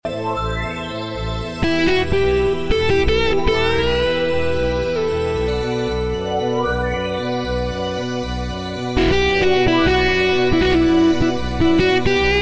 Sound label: disco blues, korg pa3